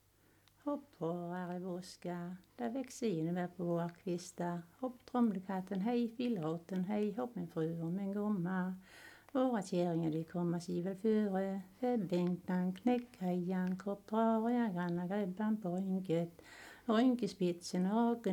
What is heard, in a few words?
Folk music of Småland; Hopp, hurra i buskar